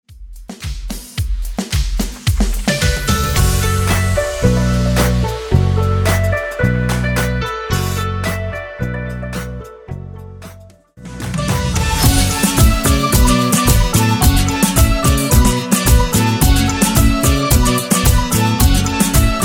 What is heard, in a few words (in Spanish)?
Folklor Mix Intro Dance, Bpm 110